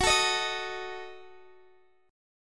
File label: F sharp Minor 6th, F sharp, Guitar, Open D; chordshape; down